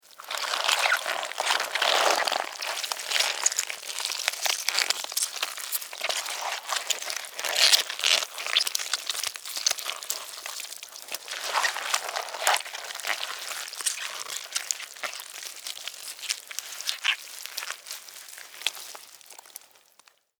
Larva Queen Eggs, Larva, Queen, Lay, Egg, Movement, Slimy, Wet, Insect, Bug; Larvae; Animals:Insects; Blastwave